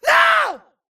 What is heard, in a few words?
grunt death crush; Titanfall 2